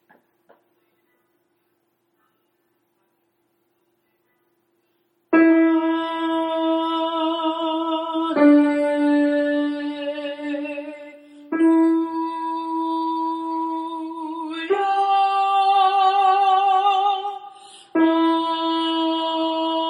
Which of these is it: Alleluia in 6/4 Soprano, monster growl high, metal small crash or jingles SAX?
Alleluia in 6/4 Soprano